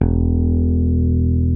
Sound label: jazz ba; DirectX SDK; DMusProd